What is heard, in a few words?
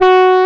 G4, ANA HRN